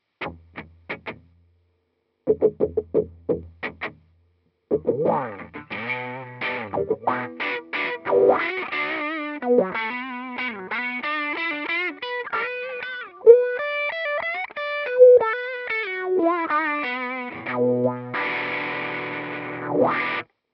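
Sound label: WA, wah wah pedal; WA with SHOD on Deluxe1; Some more WA with SHOD on Deluxe1; guitar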